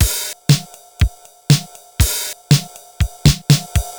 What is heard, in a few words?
TR 505 (120BPM), beats; musicradar; cyborg beats